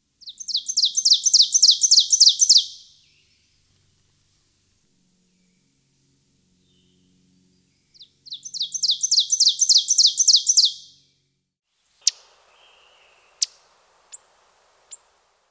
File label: Ovenbird